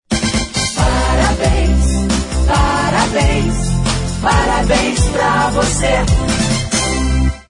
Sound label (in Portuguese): Parabens Pra Voce, Vinheta